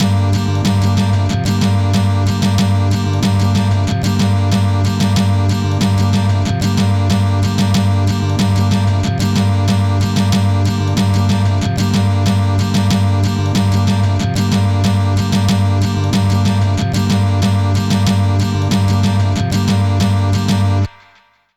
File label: Eagle Strum, Guitars; Zero-G Smoke